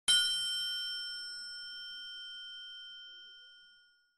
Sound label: bell ding; spongebob